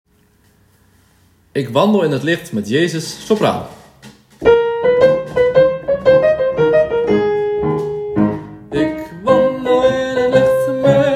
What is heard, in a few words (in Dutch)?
Sopraan